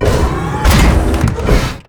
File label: biomech blocker turn